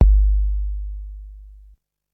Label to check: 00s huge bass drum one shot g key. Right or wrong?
Right